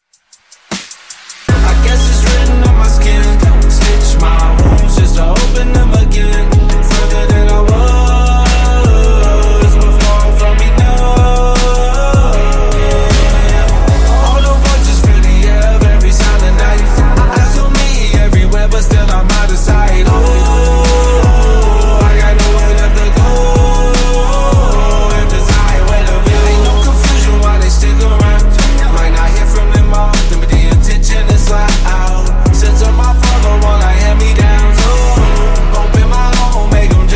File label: Intro Outro 78bpm